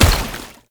Heavy Step Water, Footsteps; Enemies; Foley; Warframe; Tau Dax

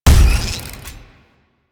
Shot Fire; Warframe; Afuris Prime

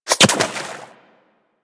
Bullet Impact Water; Warframe; DE; Weapons

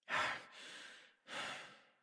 Horse Calm; Warframe; Duviri

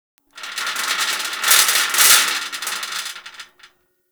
Rattle Metal; Warframe; Corpus Gas City; Ambience

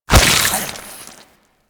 Infested Face Hugger Latch; Warframe

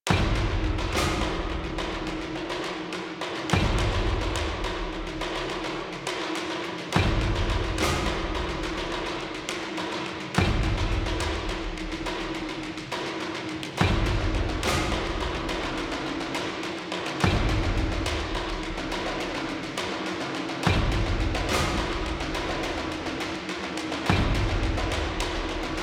Cmbt Perc B, Music; Warframe; DE; Dynamic Music; Sacrifice One

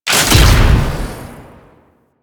Turret Fire; Warframe; Duviri; Duviri Turrets

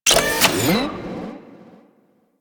Menu Deploy Drone, UI; Warframe

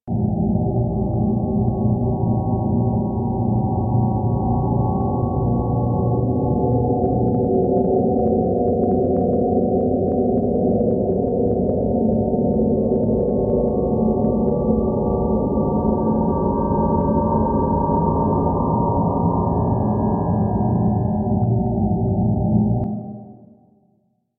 Disorienting Drone Amb B; Ambience; Interior; Warframe; Orokin

